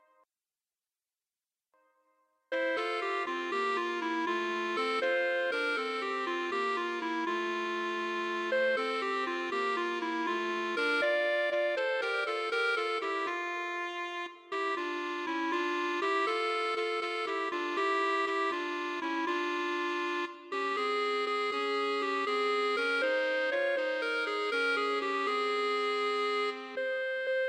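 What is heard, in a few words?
Gigue clarinet alto sax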